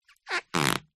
office sticky tape stuck to floor foot or hand rub squeak